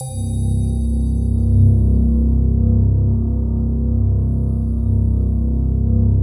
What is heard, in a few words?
TRIAN, synbell